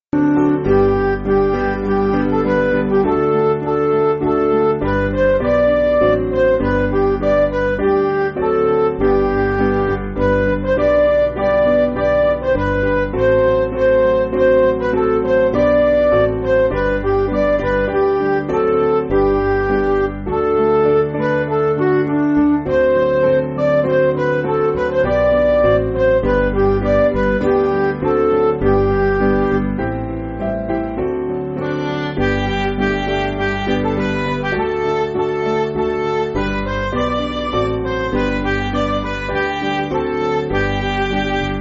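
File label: Midi, Music Backing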